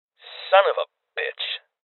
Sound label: Sniper Miss